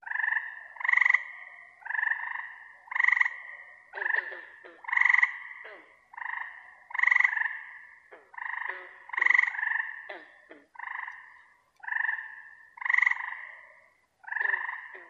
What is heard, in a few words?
Frog Various